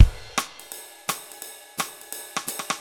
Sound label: drum loop